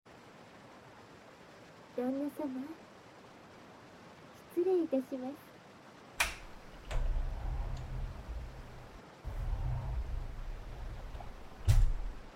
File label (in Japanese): お風呂, ASMR